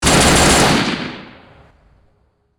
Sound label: Boltgun - Burst; Warhammer 40000; Tabletop; munitorum Volume I Sound Effects